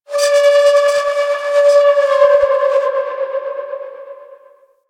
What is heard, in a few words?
Asteroid Metal Groans And Scrapes; Ambience; Warframe; Grineer Asteroid